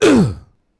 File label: Death Vox; Civ4 beyond sword